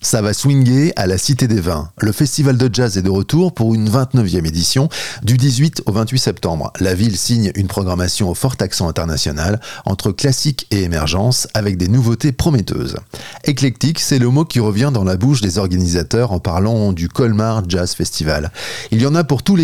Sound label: Festival jazz; Colmar ça va swinguer à la cité des vins